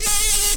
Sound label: Lapidarius queens, bumblebees